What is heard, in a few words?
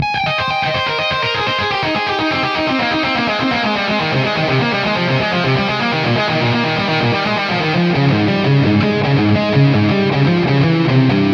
Dutch breeze opening